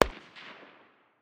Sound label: ak 762 far distance; tacz-tweak-pack; taczmechanics